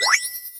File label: SFX Item Coin; Mario Kart 8